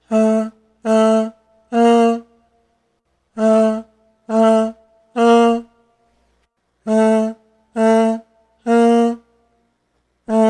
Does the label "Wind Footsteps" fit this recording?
No